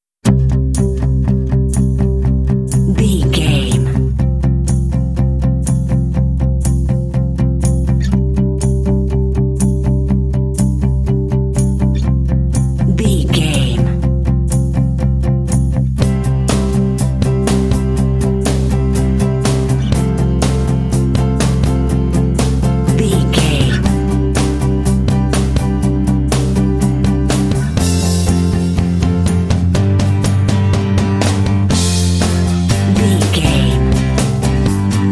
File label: indie anthem pop rock